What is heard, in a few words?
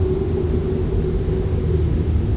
ambi air